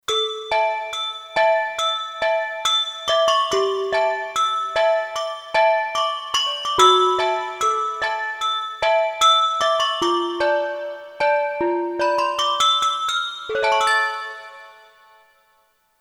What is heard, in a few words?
Bell Player, Kurzweil K2500X; Factory Programs